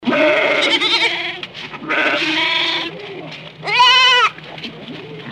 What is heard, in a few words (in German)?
ziegen